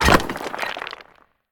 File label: wall destroy; Smite; Cabrakan